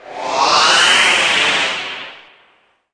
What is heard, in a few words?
Torp Grav Effect